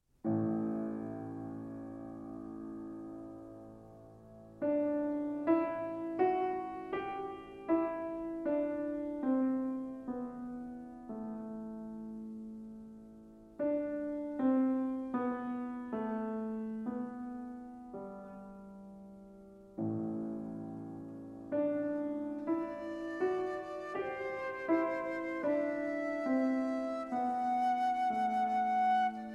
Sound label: Sonata For Flute and Piano, Lento